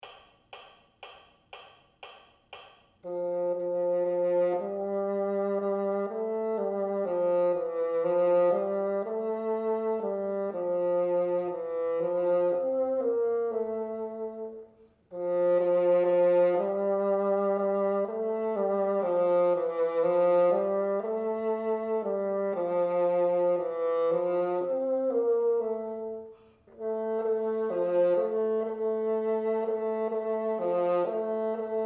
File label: teacher, bassoon